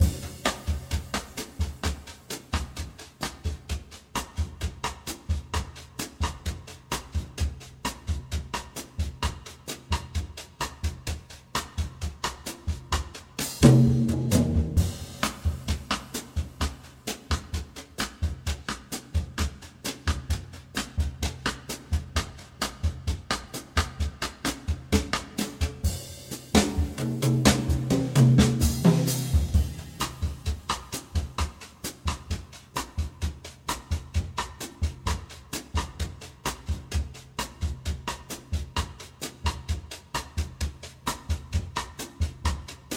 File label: Bossa Nova Loop 130